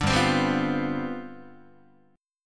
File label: G 11th, Guitar; strummed